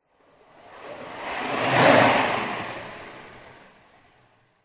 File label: sport skateboard pass by skate med fast